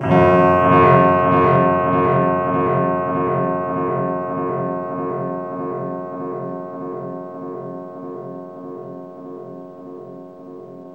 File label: CLANGARA, ODD ATMOS; Zero-G Ambient 1